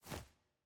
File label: Inventory Close